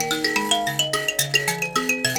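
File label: grand mbira phrase, African; Roland LCDP11 Africa VOL-1; M'biras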